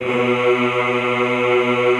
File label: EHH B1, Classical Choir; Best Service; Extended Classical Choir; EHH MIX